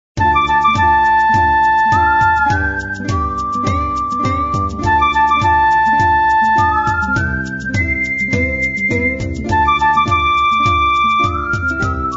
cowboy music; Country

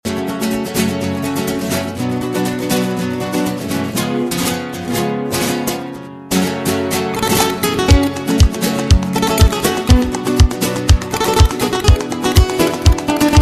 italian classical guitar

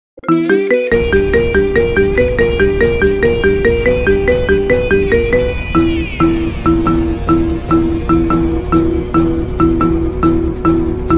marimba remix; Wonder Woman

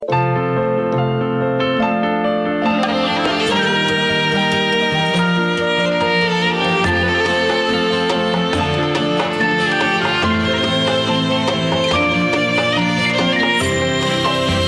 melody; Neskafe; World Cup

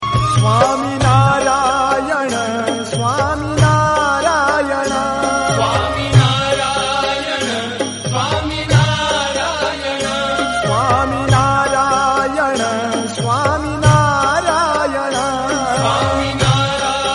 swaminarayan ringtone; Religious

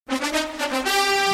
trumpet; NFL

